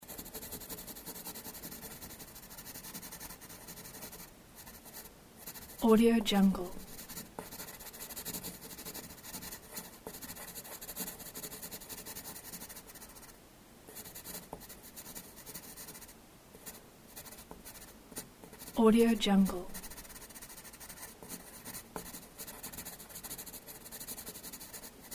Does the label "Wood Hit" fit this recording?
No